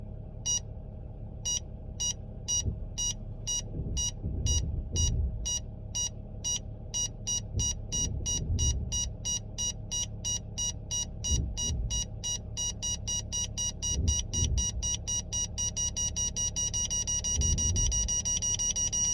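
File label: parking sensor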